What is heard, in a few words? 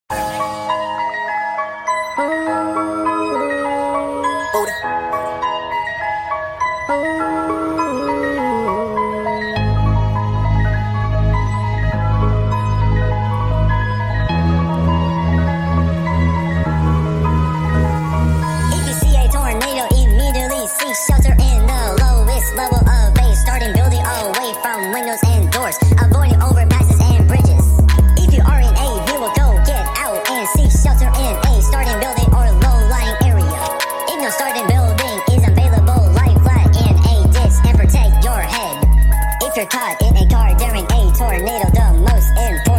Tornado Sped Up